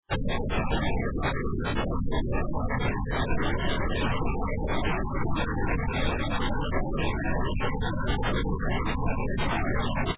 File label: Pink Noise File; stereo